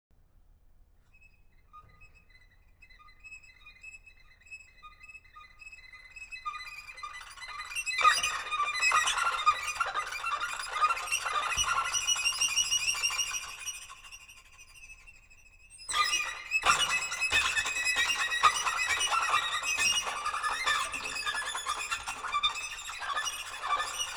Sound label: Train